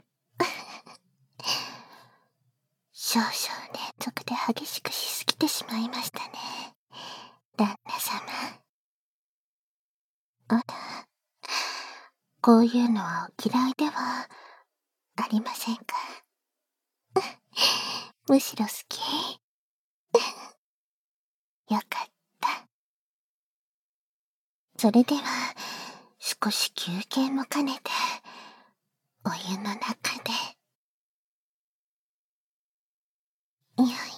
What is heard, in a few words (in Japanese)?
耳舐め焦らし バイノーラルマイク収録 効果音なし